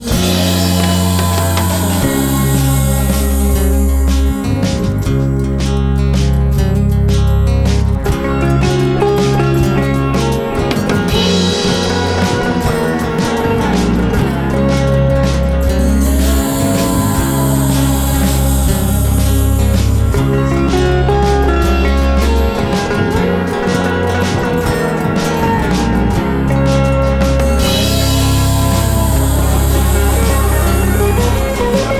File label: Emotions guitar solo